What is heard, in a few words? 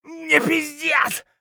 hurt neardeath; Escape from Tarkov